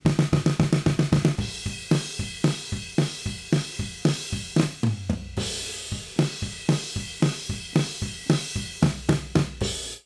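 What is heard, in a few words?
drum dual mono 35ms original mono, Dual Mono with right delayed 35 ms + Mono Original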